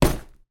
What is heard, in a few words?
handbag put down on table; foley